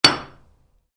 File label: Hammer stone, Hammer: Impacts Stone; Tools; Stuart Duffield